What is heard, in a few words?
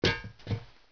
metal shuffle; hereticiidemo; player